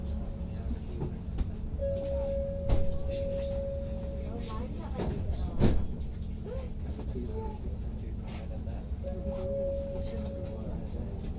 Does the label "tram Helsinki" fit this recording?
Yes